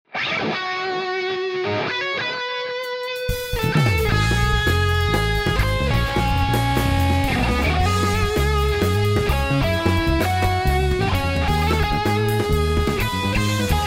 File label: chuggin